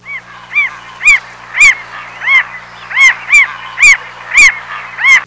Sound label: Mocking bird